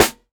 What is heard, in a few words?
rich top end snare drum sound f key; Duration 0:01; snare drum samples